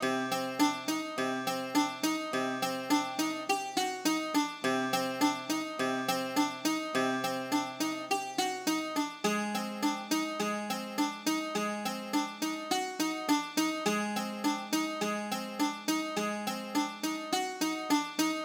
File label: Bouzouki, 104 Cmin